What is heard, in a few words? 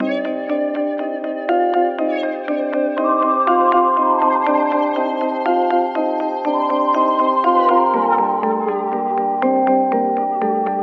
121 BPM G Min, Melody; Cymatics; 2022 Melody Collection Platinum Expansion